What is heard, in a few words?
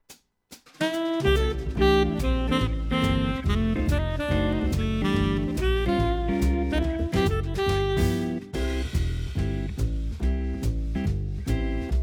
yamaha tyros 5 styles cool jazz club